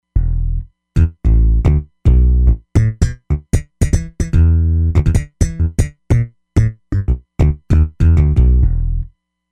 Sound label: X Slap Bass